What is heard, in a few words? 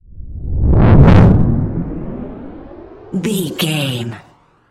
sci-fi vehicle pass